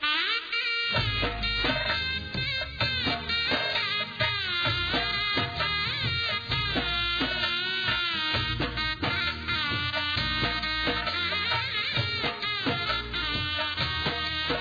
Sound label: Folk